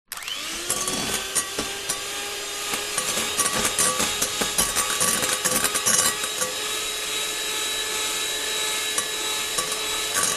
household electronic hand held whisk whisking cream glass bowl